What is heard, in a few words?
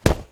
PUNCH; sharks; sloodle; gaming